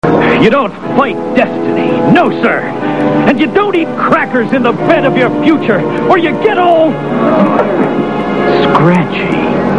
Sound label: scratchy; TheTick